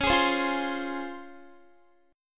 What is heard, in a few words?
C sharp Major 7th C sharp Guitar; C#M7; strummed